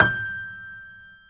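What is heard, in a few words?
G6, grand piano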